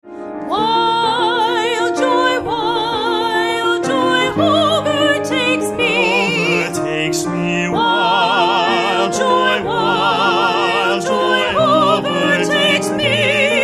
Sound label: Wild Joy Duet